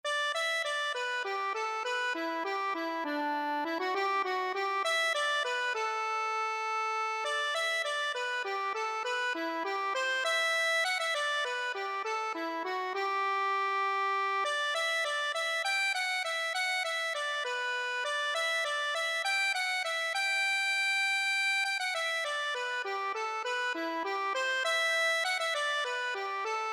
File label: accordion